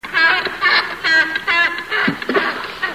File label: phalacrocorax aristotelis